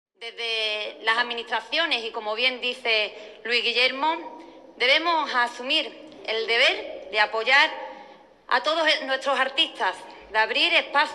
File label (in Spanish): flamenco